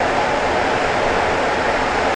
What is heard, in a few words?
Wind Rushing Loop; Lucius III; pc linux